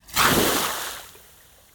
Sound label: earth attack